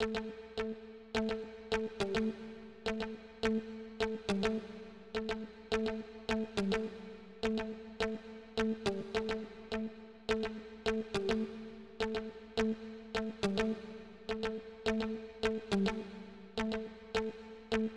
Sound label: 105 BPM A Min Muted Guitar; Melody Loops; Daylight; Retro Collection; Cymatics; ANALOG EVOLUTION Beta